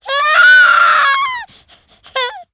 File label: CRY, baby